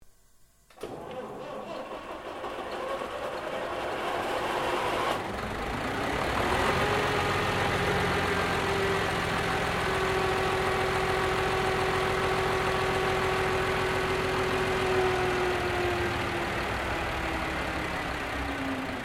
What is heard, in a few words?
GENERATOR ENGINE DIESAL START STOP, Sounds onboard HMAS Castlemaine; Boats; Vehicles